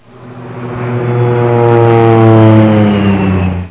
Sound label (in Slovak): Lietadlo; Mesto a dvor; Revelation Sight and Sound SK